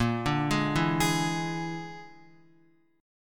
Bb 7th Flat 5th Bb, Guitar; Bb7b5; arpeggiated; JGuitar

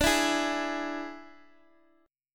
D Suspended 2nd Flat 5th, Dsus2b5 chord; x,x,x,7,5,4; strummed; Standard

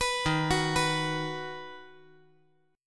D sharp Minor Sharp 5th D sharp; arpeggiated; D#m#5; JGuitar

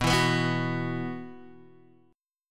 B Suspended 4th, Guitar, Open D

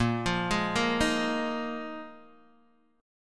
A sharp 7th Suspended 4th, Guitar, DADGAD

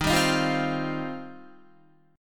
Eb 6th Add 9th, Guitar; strummed; 11,10,13,10,12,12

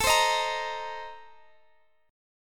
A sharp Major 7th Suspended 2nd Suspended 4th

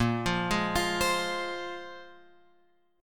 A sharp 7th Suspended 2nd, guitar; A#7sus2 Chord {6 8 6 X 6 8} arpeggiated

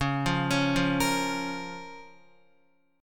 Db 6th Db, down, Guitar; arpeggiated

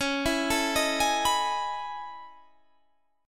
A Major Sharp 11th, C sharp

